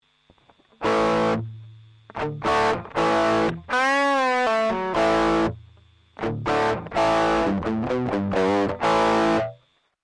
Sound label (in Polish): jrc4558D, ts9, gitara